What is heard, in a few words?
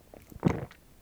swallow